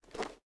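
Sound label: footstep armor; Smite; Baron Samedi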